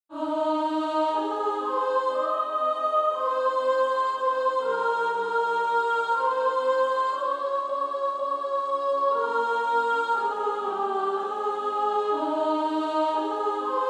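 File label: Female Choir, soprano 2; On Christ the Solid Rock I Stand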